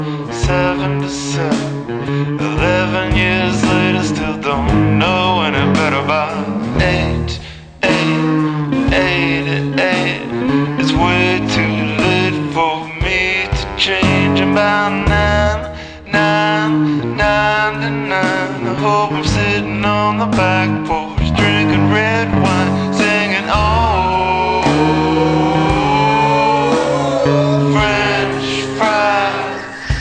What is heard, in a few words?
rock